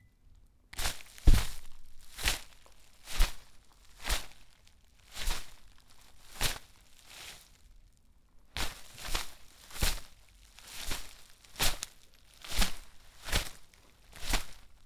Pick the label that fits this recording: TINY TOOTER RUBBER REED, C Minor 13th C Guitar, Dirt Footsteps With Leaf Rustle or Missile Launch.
Dirt Footsteps With Leaf Rustle